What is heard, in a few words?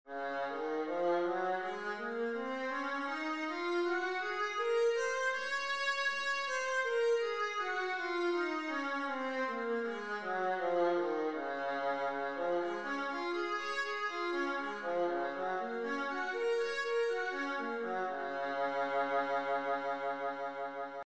viola D flat major; scales